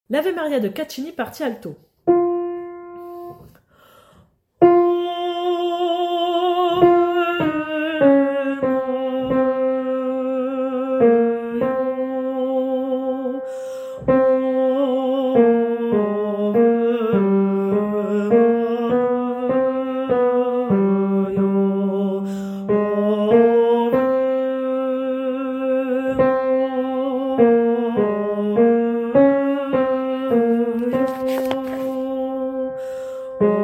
partie alto

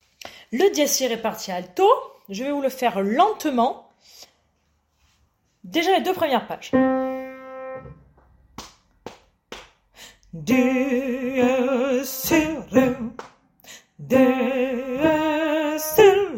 Alto

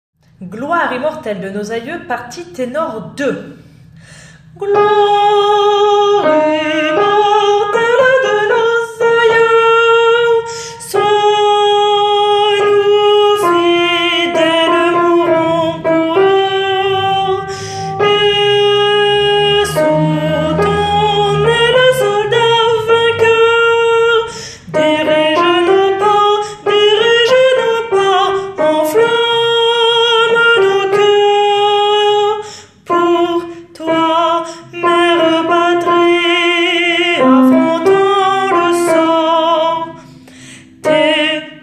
ténor

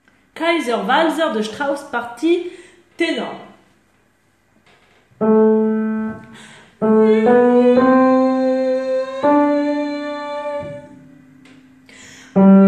valse ténor; KW Strauss 1ère

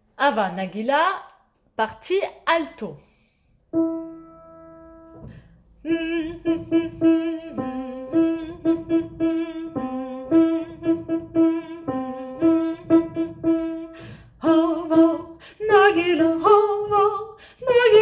partie alto

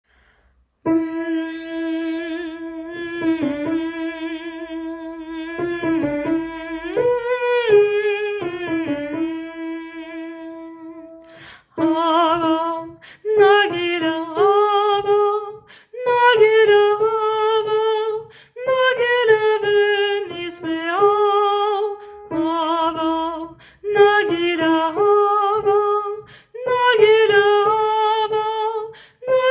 partie soprano

